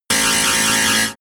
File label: tile cutter synthetic